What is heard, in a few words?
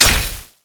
basicattack; LoL; varus